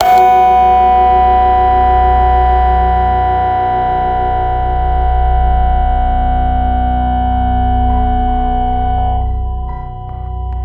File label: synth ethnic instrument hit; BluezoneCorp; Better World - Ethereal Ambient Samples